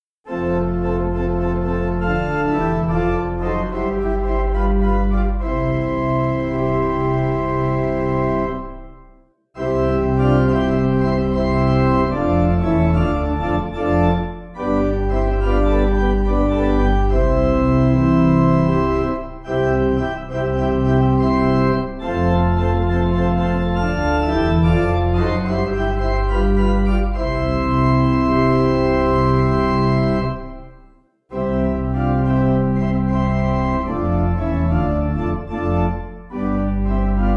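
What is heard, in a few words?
In The Heaven, Pipe Organ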